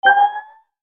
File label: paint started